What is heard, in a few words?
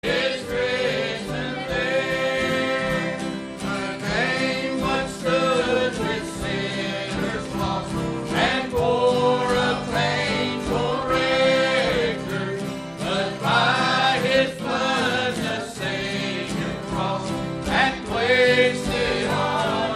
Choir